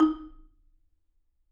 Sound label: MMEDD D#, Marimba; Sampleheads - Dave Samuels Marimba & Vibes